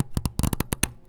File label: Bumpy Texture; BB Retail Therapy Sample Pack